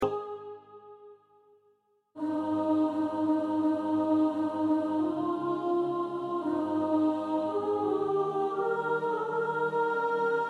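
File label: soprano, practice music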